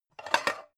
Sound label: pan take slide slow; objekte; topf